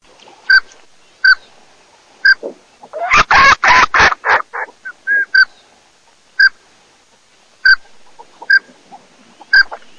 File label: maicero, birdsound